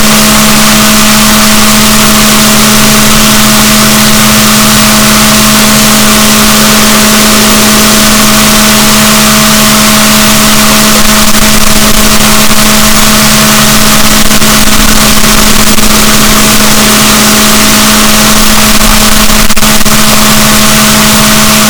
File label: rorquals negatif, boat